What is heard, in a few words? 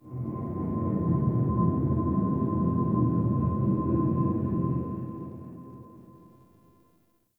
Energy Low Texture Large, Corpus Ship ambience; Textures